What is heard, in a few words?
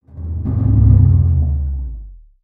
Pillar Collapse